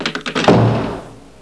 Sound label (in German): Schlaf Tür